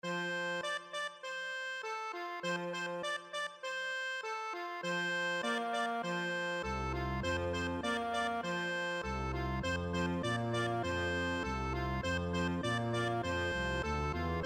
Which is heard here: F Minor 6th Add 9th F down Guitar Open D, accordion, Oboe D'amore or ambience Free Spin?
accordion